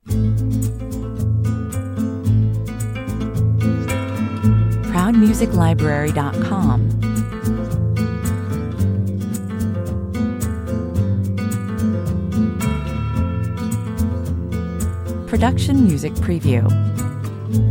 A warm-blooded, sensual Mexican ballad with spanish guitars, 110 bpm; A warm-blooded, sensual Mexican ballad with spanish guitars and accordeon.